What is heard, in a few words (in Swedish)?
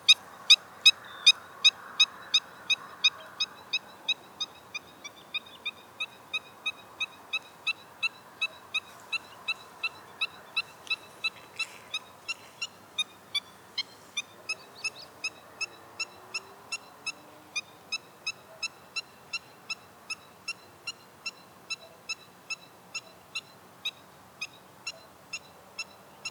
Styltlöpare